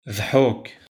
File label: laugh